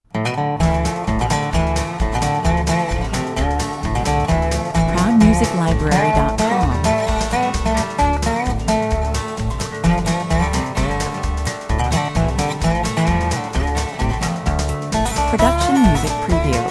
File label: A melodic country track with a train-like brush drum rhythm, featuring Dobro top line, 130 bpm